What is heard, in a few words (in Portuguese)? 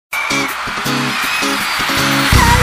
Forro